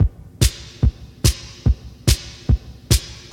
145 bpm drum beat g key, Free breakbeat - kick tuned to the G note. Loudest frequency: 1924Hz